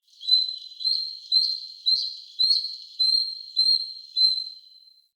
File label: Mountain Forest Predatory Bird Far